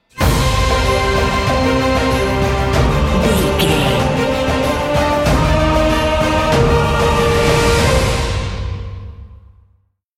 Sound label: epic cinematic sting bumper